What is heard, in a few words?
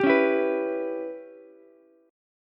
Db Minor 7th Flat 5th Db down Ukulele; strummed; JGuitar